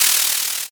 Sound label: paper tear